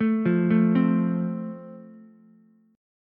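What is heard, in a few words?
A Minor Sharp 5th, Ukulele, chord strum; Am#5 arpeggiated; 2,5,5,3; JGuitar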